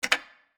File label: Tactical Clothing Buy; Custom UI Sounds; Call of Duty Black Ops Cold War